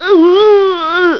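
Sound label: Fall Quicksand, gameplay sounds; Link; zelda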